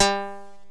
Pluck

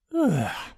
Yawn

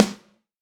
Dnb snare

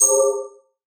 Random Ui Selection

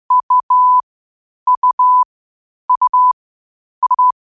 U In Morse Code At Different Speeds

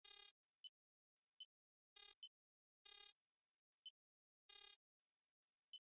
Screen Printing Combo Tonal High

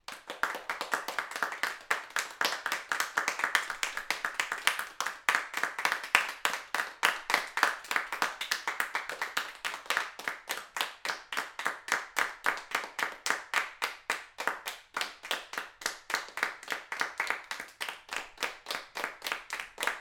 Clapping Hands

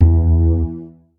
Big Bass E3

